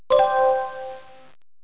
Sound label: push Key Sound; Top Models 100 Oscar Promotion